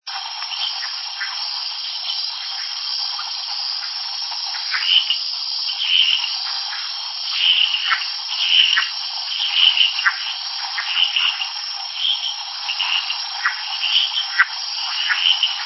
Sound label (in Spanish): Coro humedal conservado; Naha